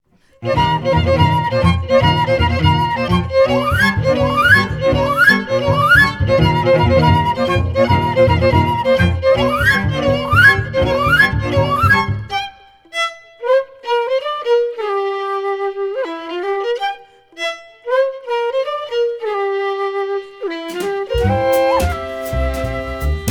Strings

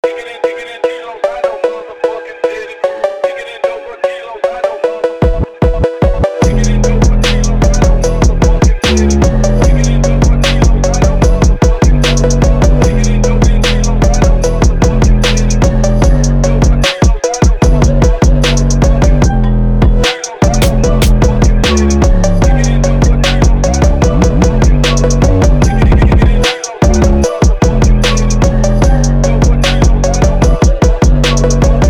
Phonk

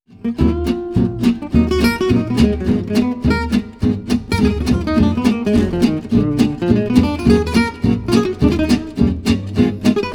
Quartet

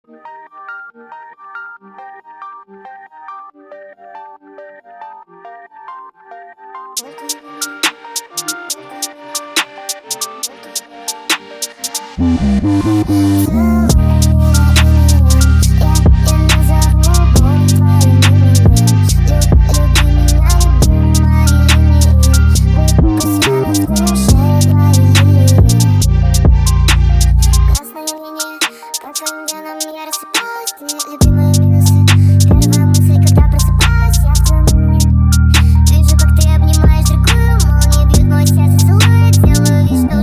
Drill Remix

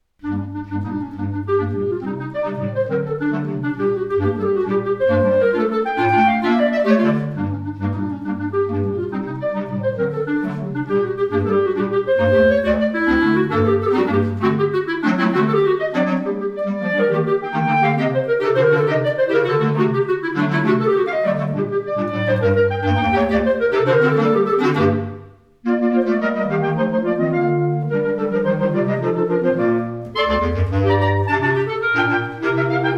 Quartet Scherzo Allegro vivo; Serenata III.

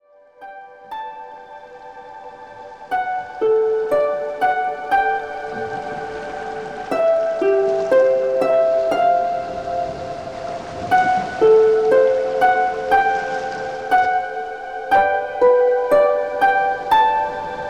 Music Box Lullabies

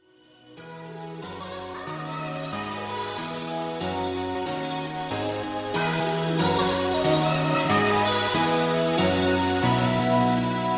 Bass

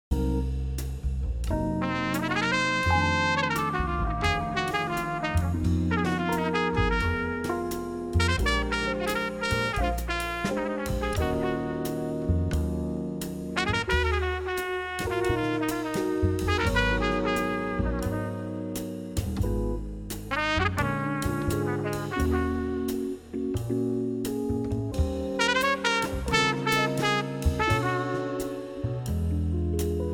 Smooth Jazz

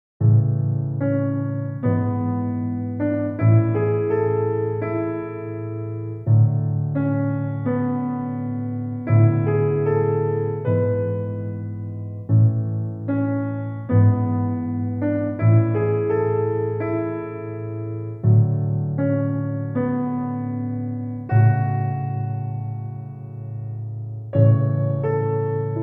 Classical Piano

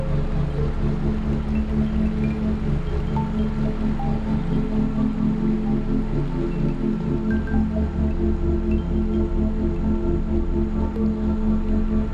432 Hz

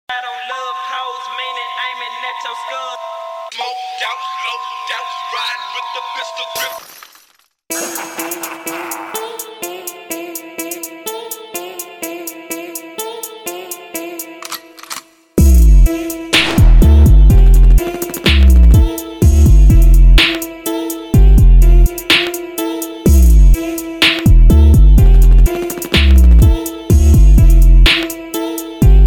Beat

